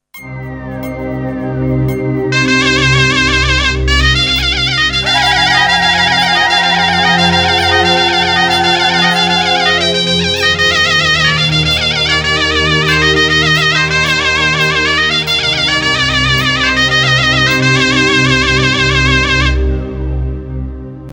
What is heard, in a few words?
ZURNA; nefesli